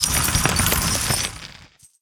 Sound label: Azerite Medium Underlay Play